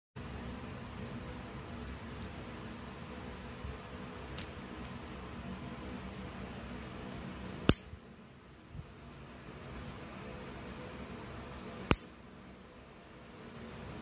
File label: Nail clipper